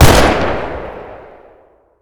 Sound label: amd, weapons; amd65